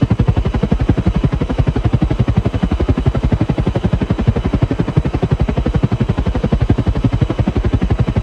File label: heavy heli mono loop; helicopter and planes